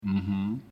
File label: mmmhmm